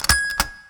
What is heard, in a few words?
Stock; Pocket Planes; android